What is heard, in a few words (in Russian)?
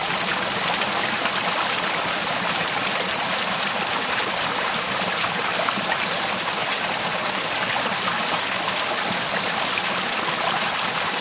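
ручей воды по камням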